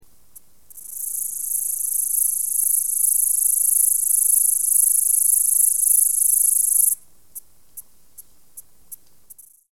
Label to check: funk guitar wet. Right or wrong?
Wrong